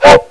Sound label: Train Whistle